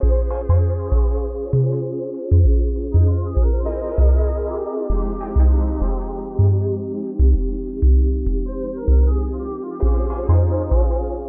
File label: 98 BPM F Min, Melody Loops - Afrobeat; Mango